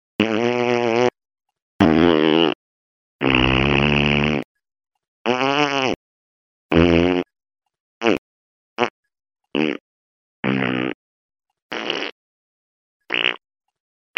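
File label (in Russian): звуки пук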